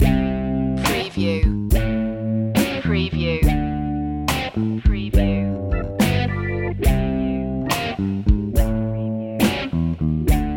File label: cool shower loop, Blues Electric